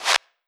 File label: hand clap reverse short